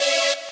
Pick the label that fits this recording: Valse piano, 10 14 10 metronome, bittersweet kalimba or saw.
saw